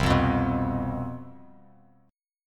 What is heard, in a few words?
C Major 7th Suspended 4th, down, Bass Standard; strummed